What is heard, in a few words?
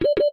Oxygen Warning